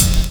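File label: hi-hat; USB Soundscan vol.46; AKAI; CYMBALS; 70 s Breakbeats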